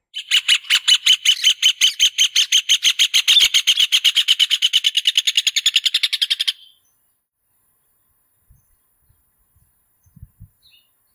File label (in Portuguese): Canto do Joao de Barro